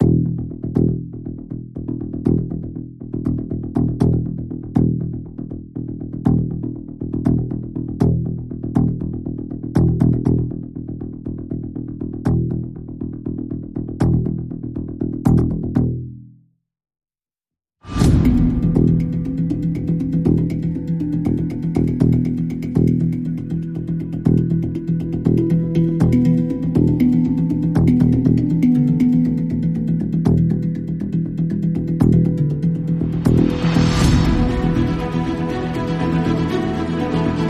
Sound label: No Perc